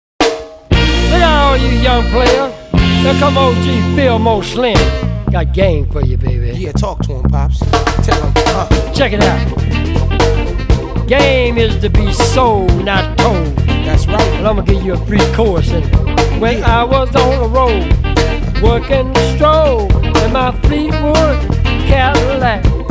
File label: rap version